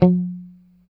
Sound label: G4 sus, Strat guitar; Spectrasonics; Hans Zimmer Guitars VOL-1; JC-STRAT MTE